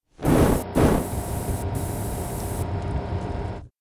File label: hot air balloon